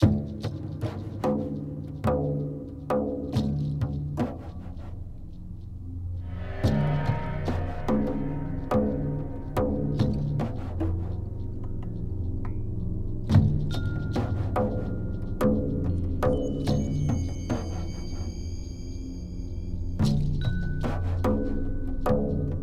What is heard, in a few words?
Amb Outdoor; The Hobbit; PS2